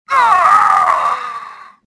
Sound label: death; stofficer